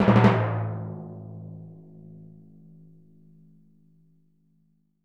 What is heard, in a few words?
TMP A#, Synclavier Percussion